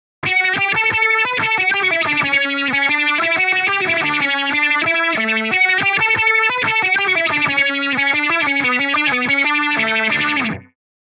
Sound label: guitar solo meta filter on